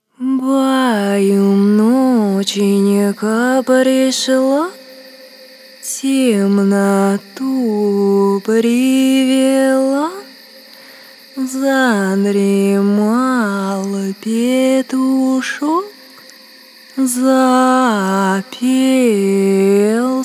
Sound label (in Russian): Русские народные колыбельные, Ноченька